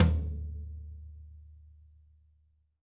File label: tom, drum